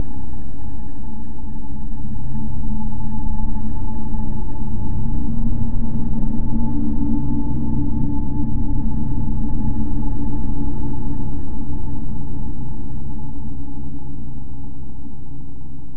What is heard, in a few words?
Dronescape